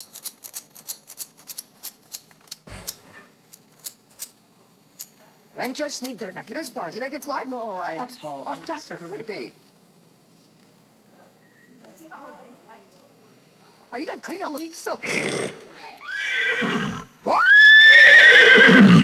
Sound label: scissors snipping pony tail with neighs and chatter; animals